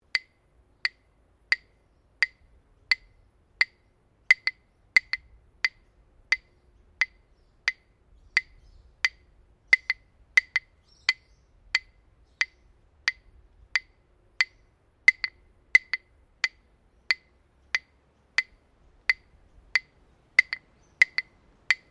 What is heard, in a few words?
Clap Sticks (Bilma) Large Sequence, Traditional Australian Aborigine rhythm sticks; Miscellaneous Musical Sound Effects